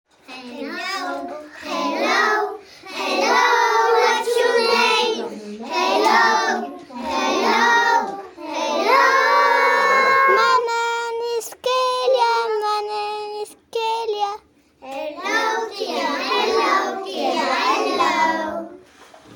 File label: Hello song